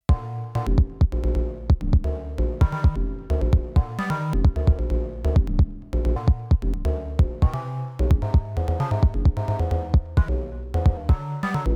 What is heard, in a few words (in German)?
Melodie Transpose; Five12